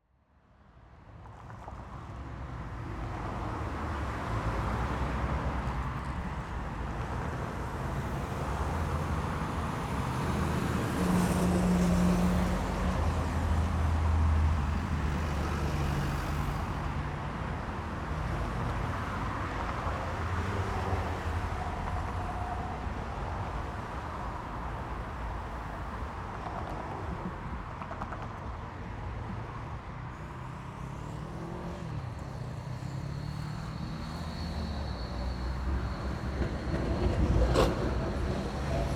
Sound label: city ambience traffic street cars and tram; General Ambience